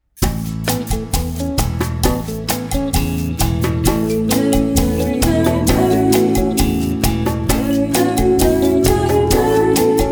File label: very merry christmastime instrumental